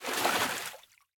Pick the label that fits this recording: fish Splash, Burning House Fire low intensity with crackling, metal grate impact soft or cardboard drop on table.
fish Splash